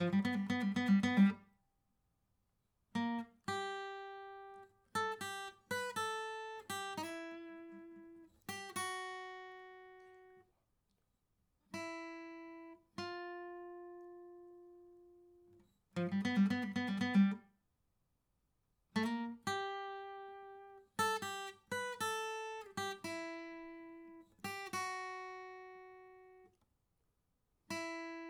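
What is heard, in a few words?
acoustic pattern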